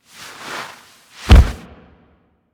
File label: Kubrow Foley Idle Back Rub Fall; Enemies; Warframe